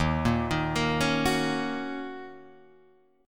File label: D sharp Minor 7th, Guitar, DADGAD